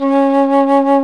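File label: Alto Flute vibrato; FLT; A.Flt vib 2; Roland L-CDX-03 Disk 1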